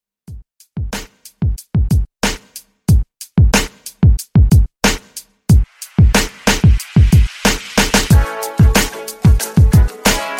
80s Classic Rock Re Drum 92 BPM

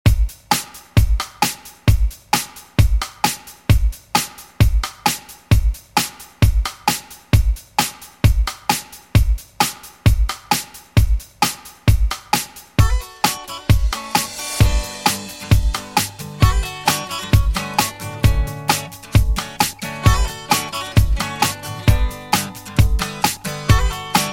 70s Redrum, 132 Bpm

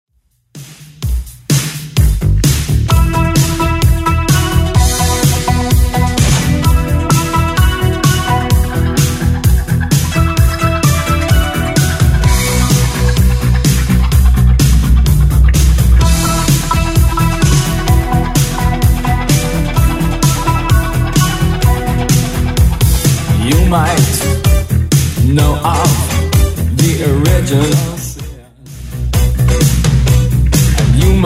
80s Redrum 128bpm

